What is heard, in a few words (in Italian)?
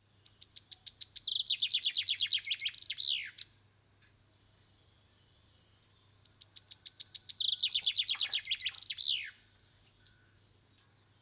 fringuello; 2 fringuello; I 7 CANTI